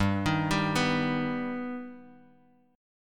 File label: G Augmented G Guitar DADGAD